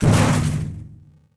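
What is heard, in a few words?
metal debris hit large; objects; Star Wars Obi-Wan; XBOX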